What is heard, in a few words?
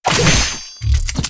lunge